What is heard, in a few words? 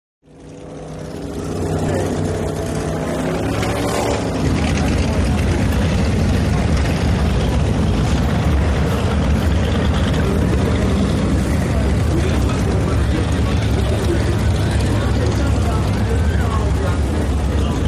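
Power Boat Ambience, off shore power boat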